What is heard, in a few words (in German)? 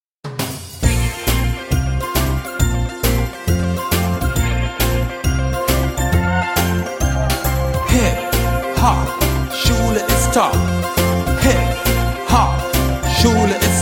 Hip Hop